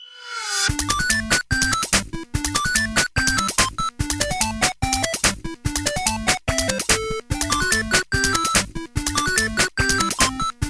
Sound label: gameboy